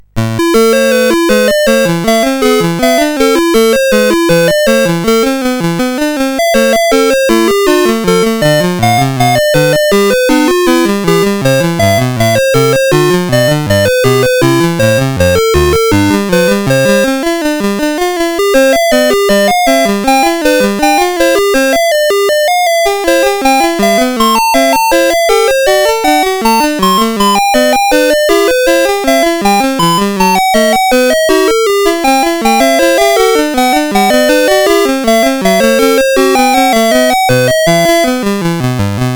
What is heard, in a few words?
sid CSG8580R5, GAMES; Alien Infiltration